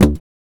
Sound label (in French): poum